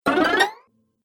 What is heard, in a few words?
Cartoon Game Fast Vibrating Jump; Bounce Sound Effects